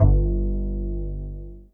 ART OF BASS G1; Greytsounds; Scott Peers Sound Engineering

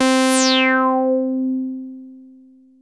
303-Style single hits; FM 57 - The Ultimate Sample Collection (1997)

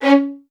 XST1 C#4, LOUD VIOLINS; Q Up Arts; Denny Jaeger Master Violin Library

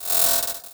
Buzz Fill, One Shot Drums

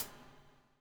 BUBL, Backbeat; Spectrasonics - Backbeat

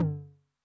High Tune Long Decay, 909, MID TOM; classic machines; 909mod; MT - MID TOM

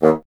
WND BSN, Bassoon

